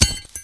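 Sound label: grass; Mafie 1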